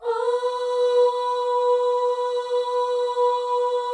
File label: FEM OHS, Symphony of Voices; Spectrasonics